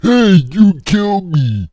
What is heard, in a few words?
death; openffa; ogro